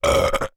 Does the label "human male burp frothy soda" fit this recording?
Yes